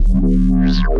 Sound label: Neuro Bass